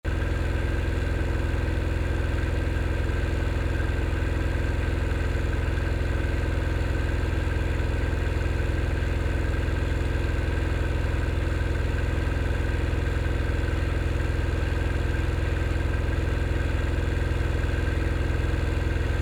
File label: soil motor noise, noise pollution in soil; curiosoil